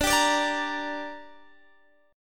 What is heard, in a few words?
D Suspended 4th Sharp 5th D down Guitar Open D, chord